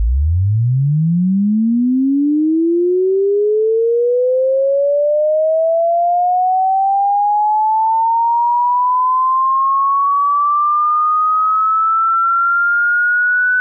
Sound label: 500Hz 1500Hz Sweep